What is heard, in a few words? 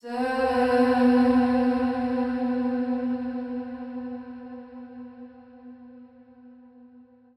130bpm Am, Vocalpack